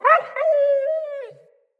Wolf Cub Trapped Call Far

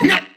Pain, Crewmen; Dialog; Barks; Battle; Warframe; CorpusCrewmen; CrewmanD

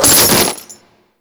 Chains Beam; Condemn; Warframe; Priest

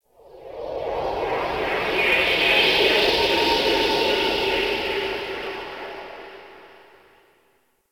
Interior Cave Wind Howls, Infested, Ambience; Misc Indoor

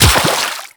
Run Water, Footsteps

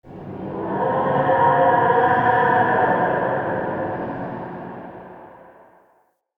Root Cave Distant Moan; Ambience; Neathuns Root Cave

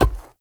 Grenade Land; Warframe; Nechro Mech; Abilities

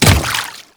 Water Step, Footsteps; Enemies; Warframe; Grineer Royal Guard

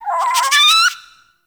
Rabbit Ambient Call; Warframe; Duviri

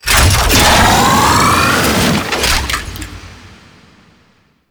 Stomp Cast; Enemies; Weapons; Warframe; Tau; Sentient Dactolyst

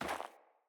Sandals Run Snow, Footsteps

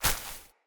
Grunt Step Veg, Footsteps; Enemy; Characters; Corrupted Sproutfolk

